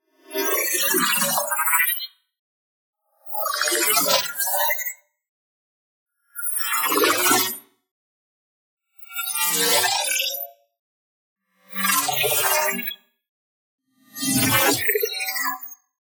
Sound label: ABILITY CHARGE Spectral Slides; boom library; Matter